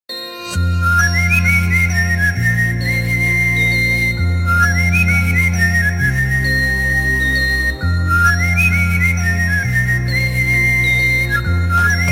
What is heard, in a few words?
whistle